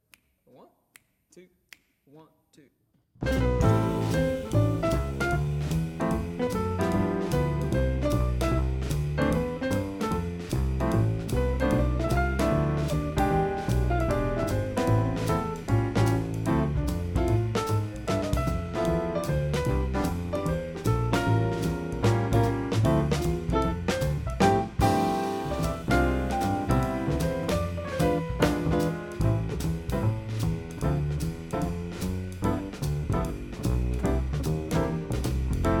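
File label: Jazz Rhythm; 2023 All State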